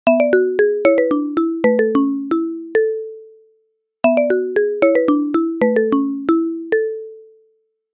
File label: Nokia Tune Marimba; tono de llamada